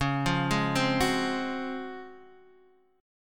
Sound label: C sharp Major 11th C sharp, Guitar Half Step Down; chord